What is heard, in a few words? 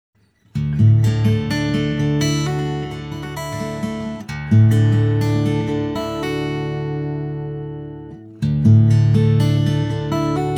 Guitar; Tonewoods